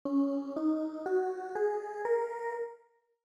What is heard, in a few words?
Improv Penta Scale; In this second improvisation on the pentatonic scale, I added in a drum loop underneath the improvisation on synth.